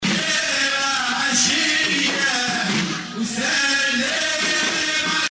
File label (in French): rythme musical